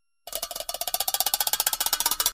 funny alert

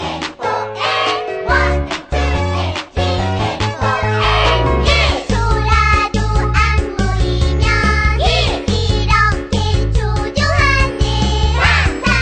number song korean; children

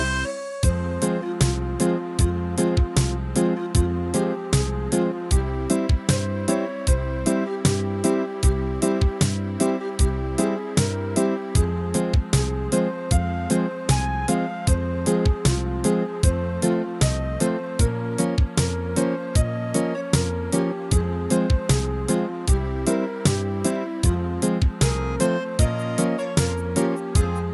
Vintage Movie Music

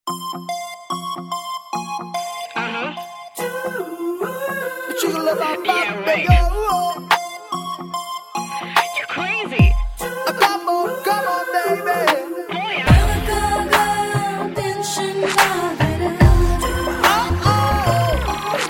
cool tamil slow jazz; ringtones

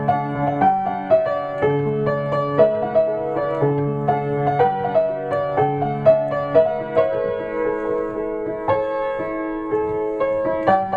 piano; children

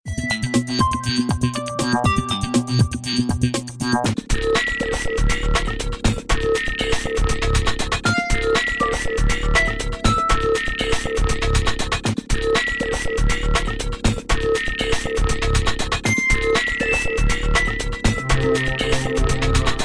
groove

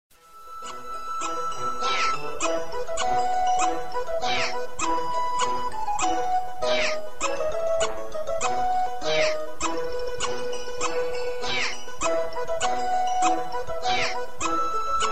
N72 WAP Squeak

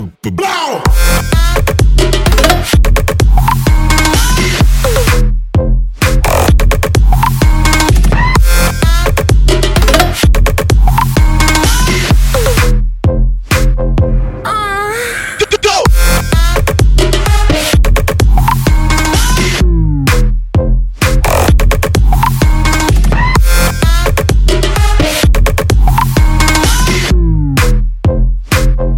Drops EDM

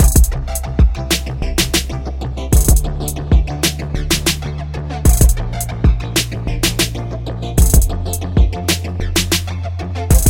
Hip Hop Ring

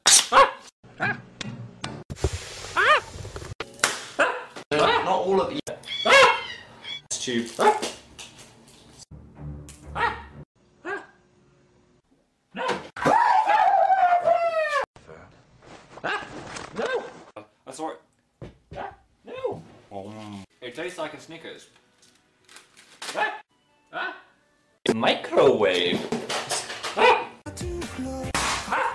Squeal

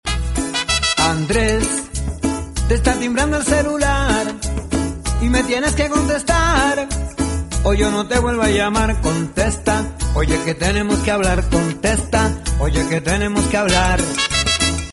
Rap

